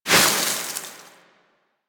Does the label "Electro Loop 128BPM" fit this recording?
No